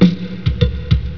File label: drum pat